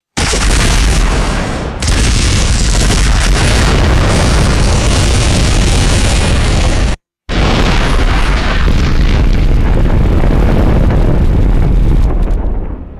massive zapping power explosions; fire

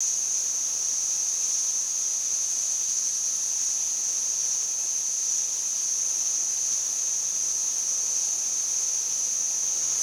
dense nighttime insect chorus with raspy chirps; animals

